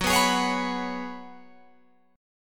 F sharp Suspended 2nd Suspended 4th, Guitar Open F; strummed; 18,18,20,20,21,18